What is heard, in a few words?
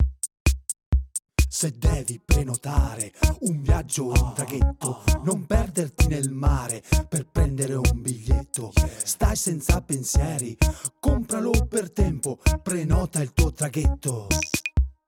JINGLE FERRY COMMERCIAL